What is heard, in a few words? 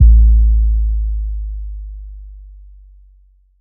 phonk kicks oneshot 808 a; kick drum